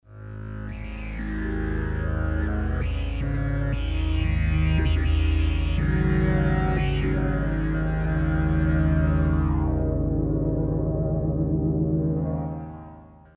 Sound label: Throaty Pad; Kris Rhen Presets